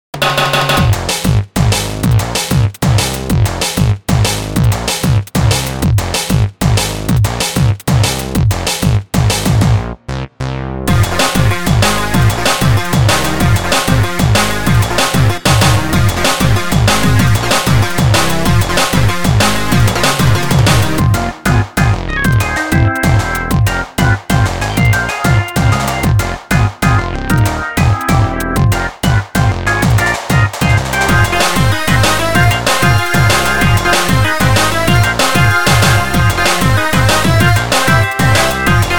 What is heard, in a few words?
inst